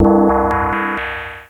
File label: chord